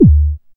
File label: BD ANALOG; Sound & Vision - Gigapack II CD 2